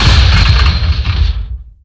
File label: Fence